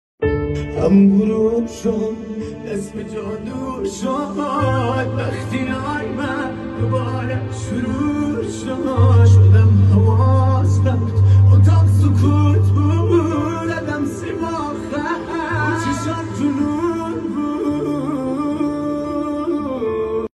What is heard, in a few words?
Piano